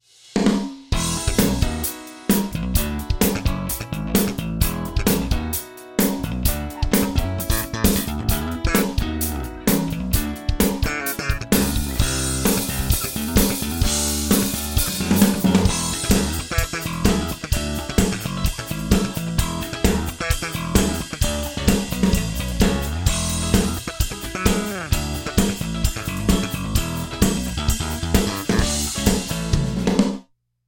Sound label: Ensoniq DP Pro Drums Band Very Small Room